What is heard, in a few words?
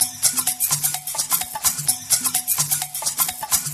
Minimal Loops